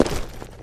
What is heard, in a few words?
tile, footsteps; Counter-Strike Global Offensive